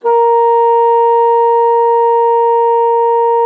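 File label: bassoon piano A#4; Xsample VOL-6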